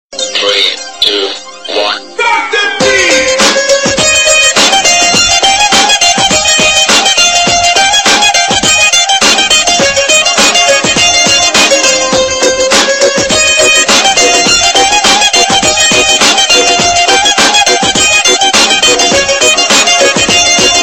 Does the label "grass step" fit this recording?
No